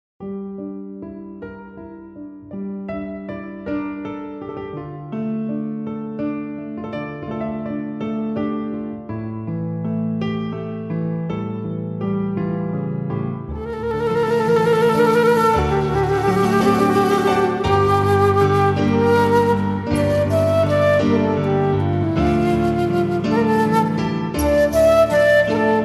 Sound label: Piano Flute